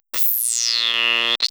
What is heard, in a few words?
electricity spells emitter